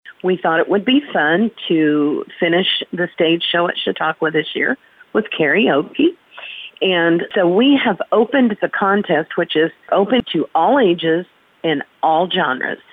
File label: Karaoke